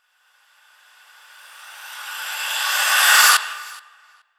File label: Breakdown FX, Underground UK House & Electro